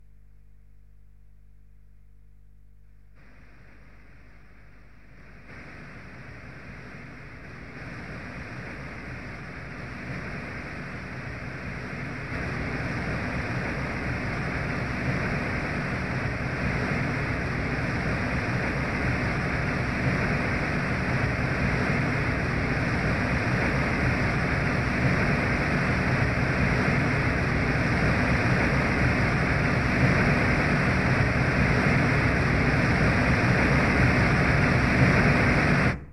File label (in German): Brownsches Rauschen